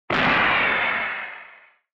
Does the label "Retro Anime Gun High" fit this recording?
Yes